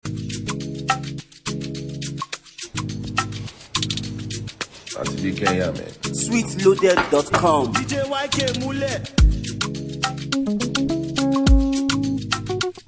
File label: Beat; Nigeria